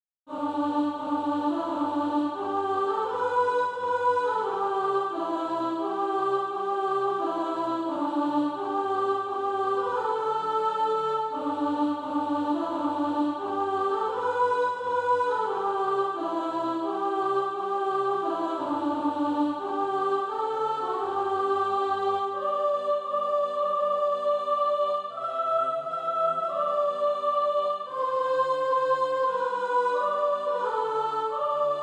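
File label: soprano, Practise Music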